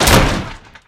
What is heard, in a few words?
wood big hard